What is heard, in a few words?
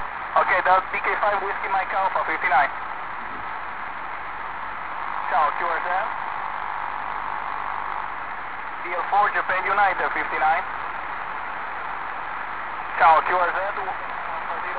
50 MHZ; 1A0KM 50.137 MHz